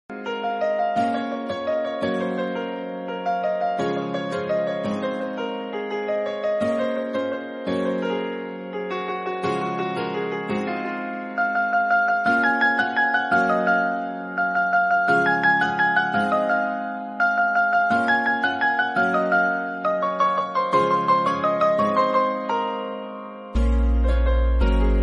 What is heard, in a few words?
Instrumental